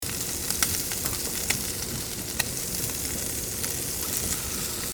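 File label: charcoal fire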